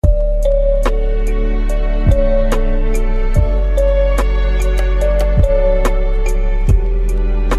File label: song lofi